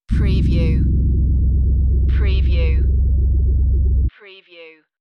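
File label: Short Deep Evil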